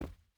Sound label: footstep burlap cloth jump land; Rust